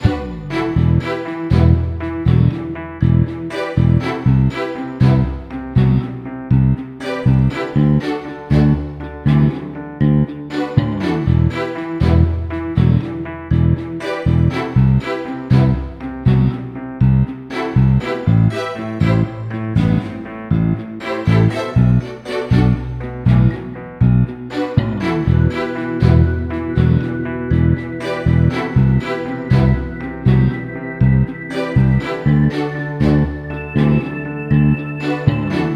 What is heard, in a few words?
questioning (moderato)